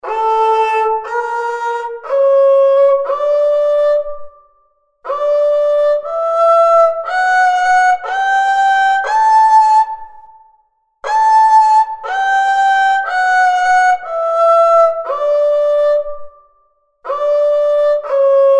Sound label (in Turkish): kemençe hicaz